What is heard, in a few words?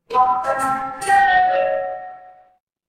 office elevator